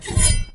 sword unleash

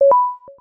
activate

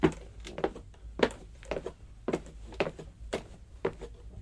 wood, footsteps

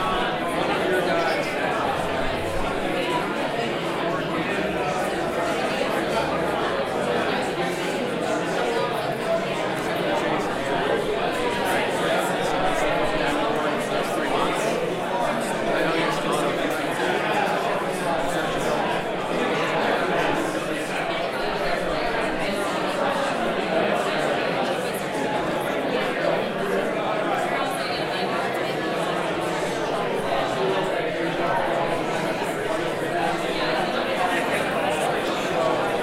audience voices

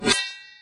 sword unleash

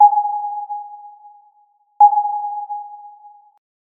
radar ping

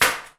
nailgun

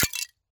small break

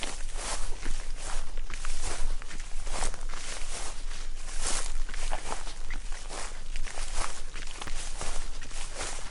grass, footsteps

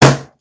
slam

